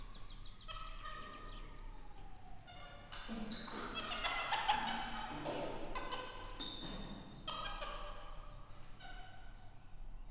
intenzíven csipog, kapucinusmajom; debreceni zoo 2012

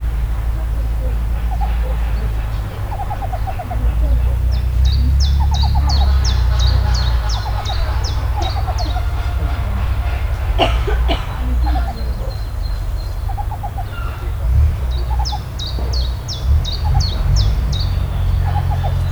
szabadban, szurikáta; állathangok; nyíregyházi zoo 2010

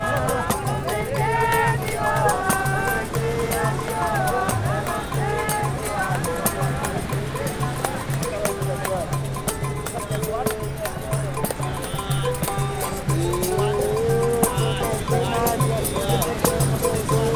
Copacabana baráti társaság zenélés ének vége

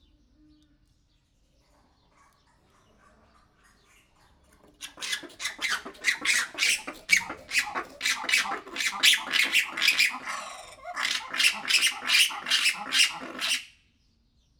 monológ, fekete-fehér vari; debreceni zoo 2019

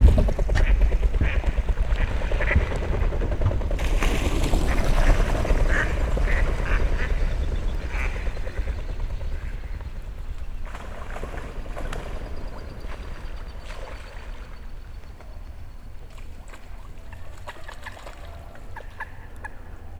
torol felszall, tokesrece; termeszetben; allathangok

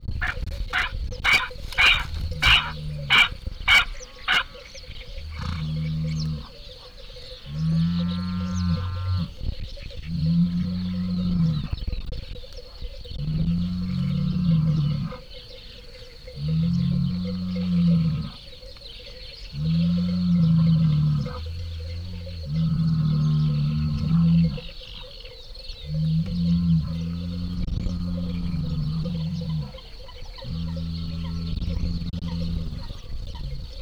éles hangon bőgő őstulok bika szél, vadszamár kulán; hortobágyi nemzeti park 2013

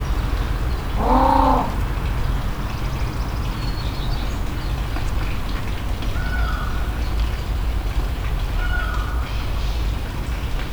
kétszer is, páva, szellőztető motor, pápaszemes pingvin; debreceni zoo 2012

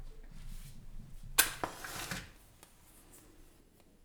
lángra lobbantás, gyufa; Perkupa

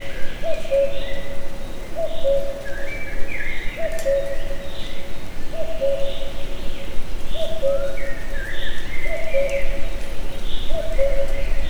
folyamatosan, kakukk; Egerturistaház

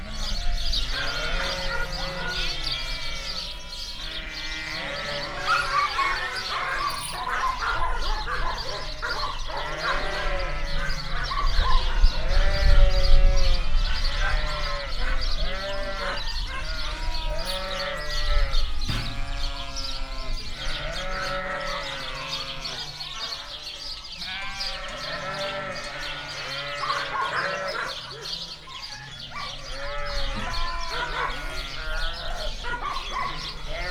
hangulat, laszi tanya

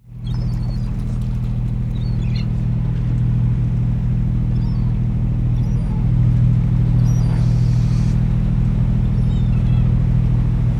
egy két vijjogás a hajóról thassos, sárgalábú sirály; allathangok; termeszetben; marantz

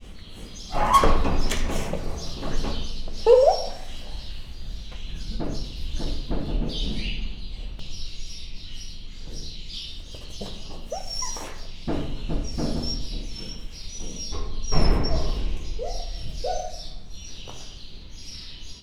bezárva, hortobágyi sinka; hortobágyi nemzeti park 2013

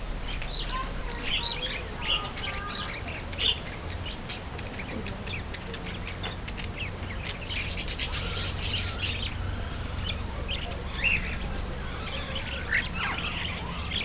kicsit hosszabban, papagájok; Győri Zoo 2014

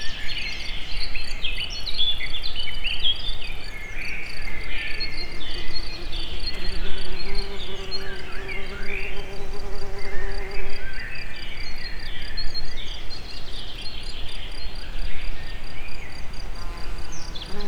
közelről ide-oda, rovarok; termeszetben; egerturistahaz

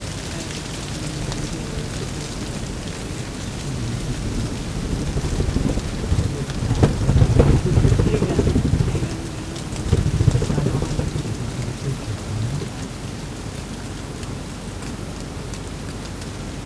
barlang intenzív beszéd, denevérek; repules; termeszetben

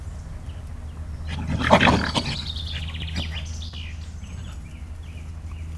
esti acsarkodás, rozsomák; szegedi zoo 2011

